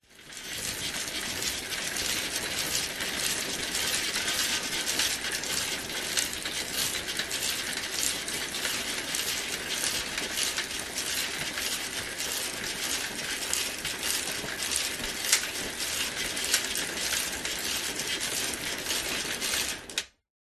bicycle pushbike pedaling med speed close to chain; vehicles